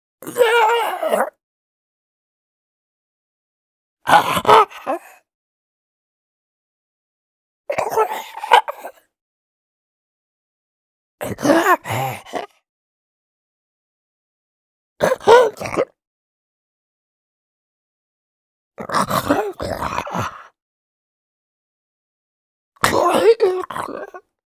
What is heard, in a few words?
ZOMBIE MALE DYING AGONY SHORT